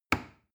plastic button small push heavy

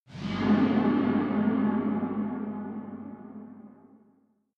tunnel horror

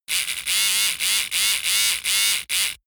servo small buzz fluctuate

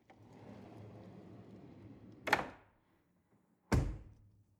drawer cutlery close draw

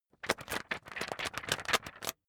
magazine wobble hard

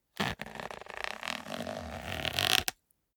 zipper small case open slow

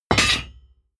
plate armor piece drop heavy

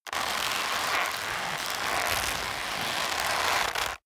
leather creak long hard

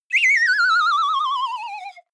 whistle slide tremolo

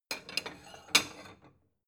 plate small shelve slide soft

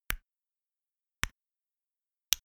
plastic stone hit

